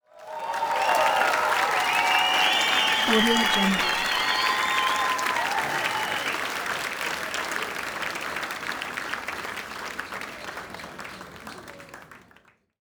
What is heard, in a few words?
club heavy cheering whistle; سوت و تشویق سنگین; game sounds